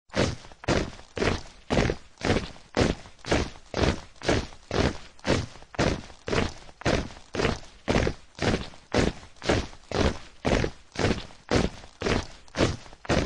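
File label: fast march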